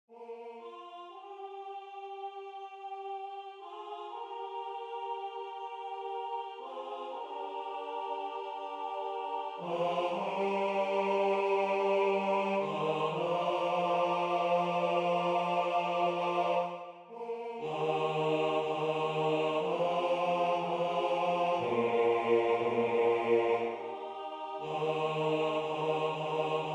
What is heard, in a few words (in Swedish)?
Jag längtar till Italien BAS